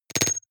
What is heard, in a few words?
noise metal hits splutter; maschinen; signale; soundpool